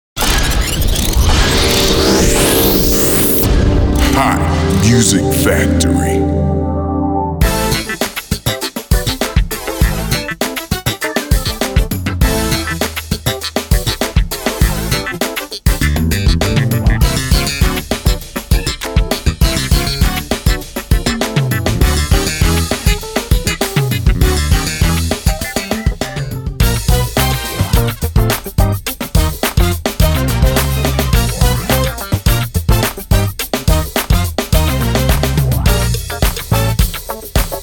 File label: Soul Funky